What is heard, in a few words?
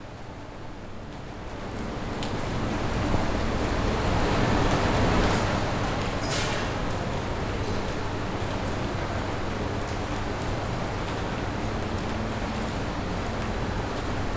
Athens. Plaka Street. Slow Rain; George Karagioules; The Source. Ambiences from Athens